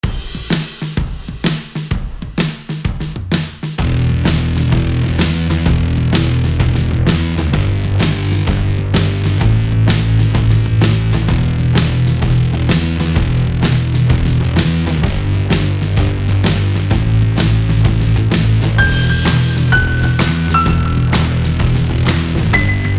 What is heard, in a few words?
Sexy Rock Shuffle